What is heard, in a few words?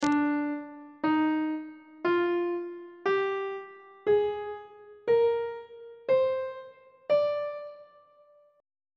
d locrian mode note positions; on treble clef